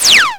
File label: Percussive, Drums Perc One Shots